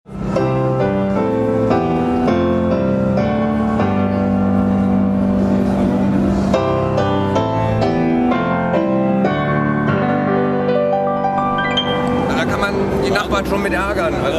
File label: Kawai CS10 Piano